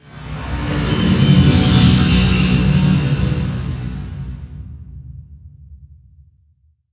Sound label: VFX Atmos